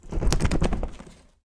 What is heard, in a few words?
bat flap; enemies; Dungeons Of Kharadum